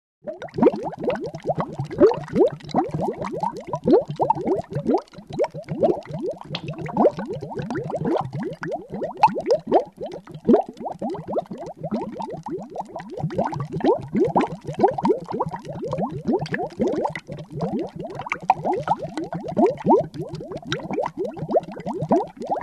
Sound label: Bubbles in the water